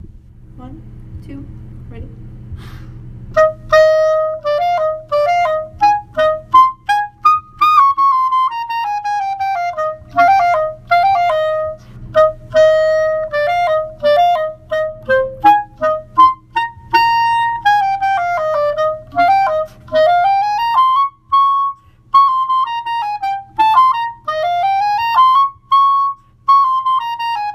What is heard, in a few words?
flute